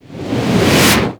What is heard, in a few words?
Environment Explosion; Gameplay; Warframe; The New War; Mission Four